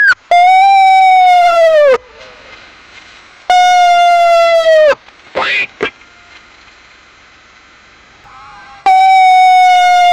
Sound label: swat officers trying to b, breaking